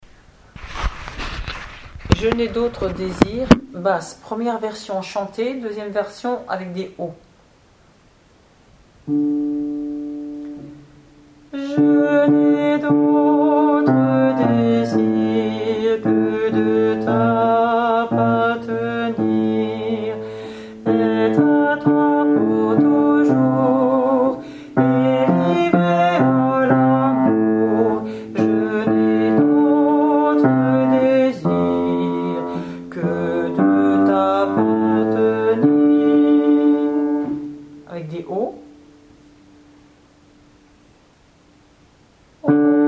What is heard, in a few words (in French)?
Je n'ai d'autre désir BASSE avec paroles